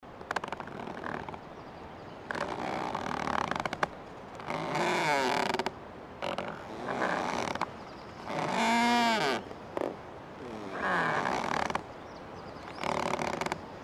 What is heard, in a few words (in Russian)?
skripy dereviev v lesu